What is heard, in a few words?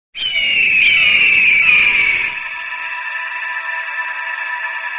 eagle sound, entry music